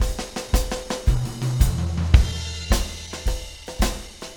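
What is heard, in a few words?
intro beat, Room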